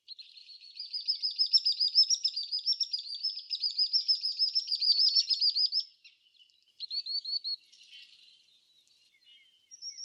Drillsnäppa

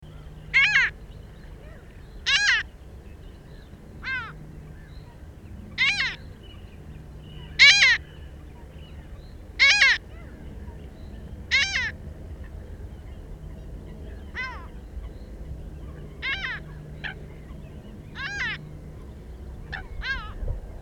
Svarthuvad mås

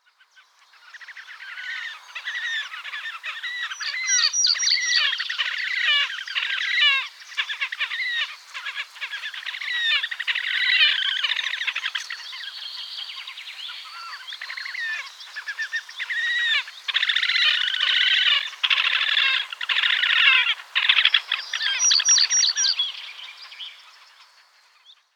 Svarthake

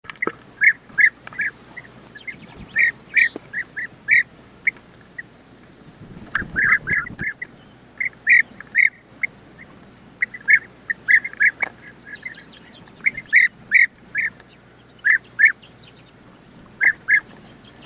biätare

